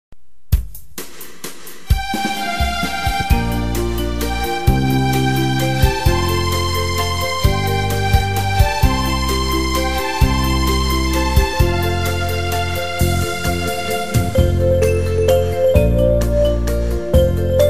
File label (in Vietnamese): nhac khong loi, beat